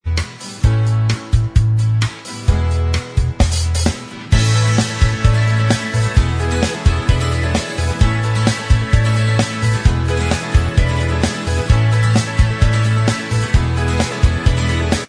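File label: instrumental Track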